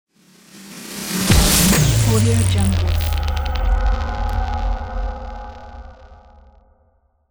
shutdown

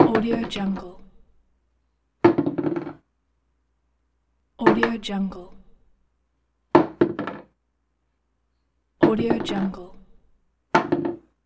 drop small item

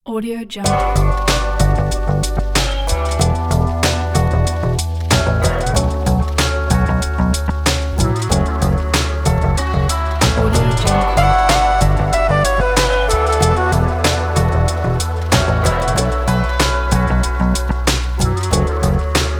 hip hop

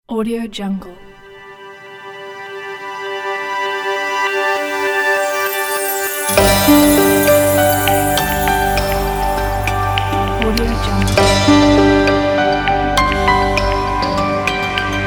summer rain logo

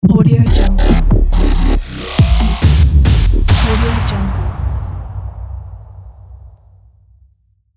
dubstep growl logo

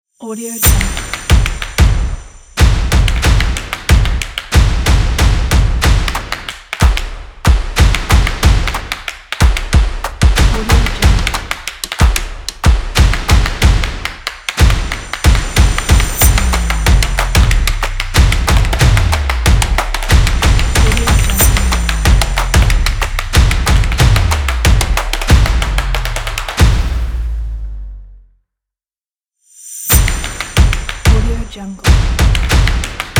stomp percussion beat

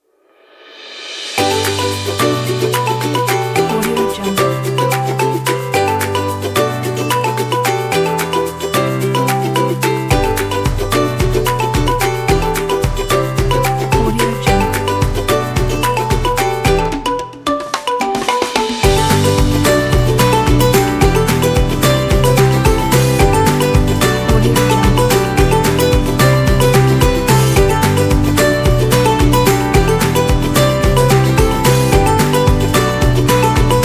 happy summer

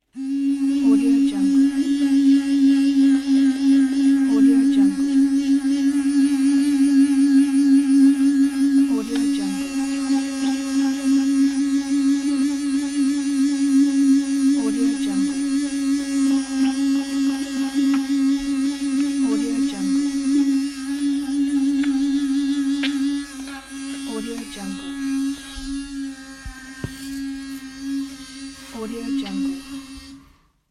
toothbrushing with electric toothbrush

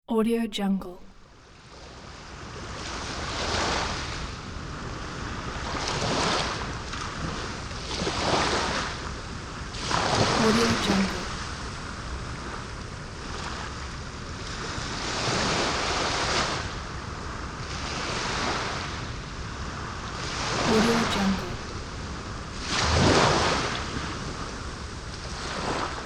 morning ocean

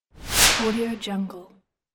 slide

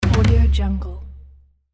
taiko matsuri japan festival

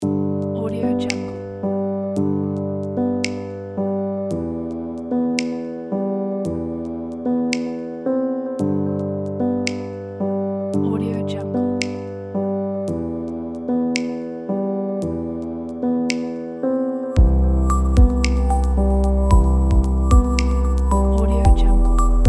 relaxing downtempo background

